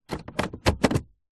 vehicles car gear stick automatic movement set gear toyota rav4